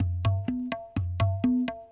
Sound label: PERC LOOP ETHNO 125